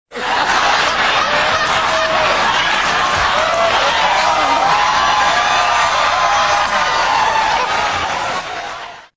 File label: Hysterical